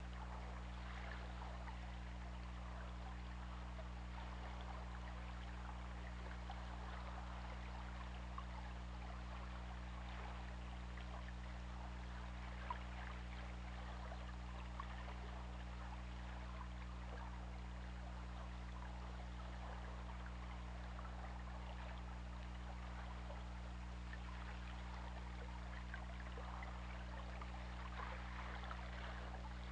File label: Orca; OrcaLab